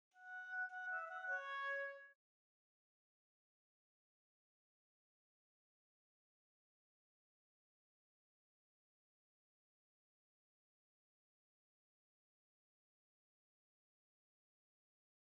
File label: Oboe